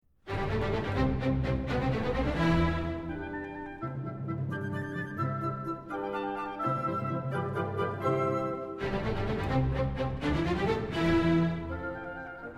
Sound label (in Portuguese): filarmonica allegro